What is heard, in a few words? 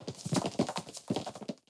Run; blue archive